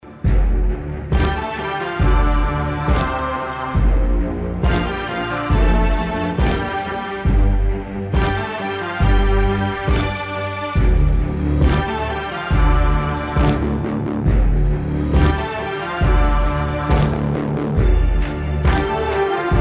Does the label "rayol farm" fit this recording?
No